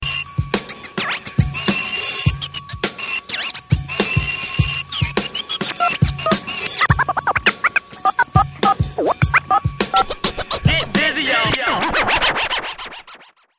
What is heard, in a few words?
scratch text